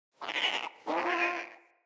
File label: Trapped Beaver Call; Encounters; Animal Rescue